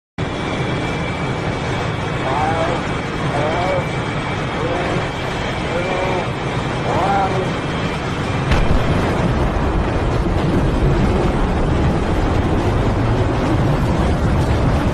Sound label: SR-71 Blackbird, هواپیما